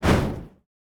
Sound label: fireball shot; Dd2 betsy